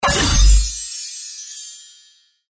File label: wheel in